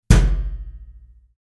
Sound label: Cartoon accent impact thud